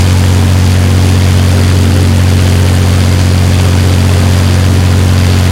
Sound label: spitfire rear, engines; War Thunder